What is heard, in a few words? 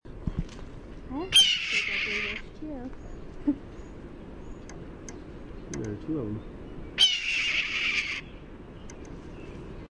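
Red-tailed Hawk scream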